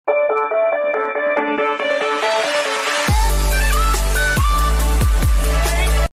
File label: outro music